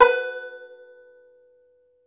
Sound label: Tenor Banjo; E-MU Producer Series Vol. 7 – Old World Instruments; Plucked Strings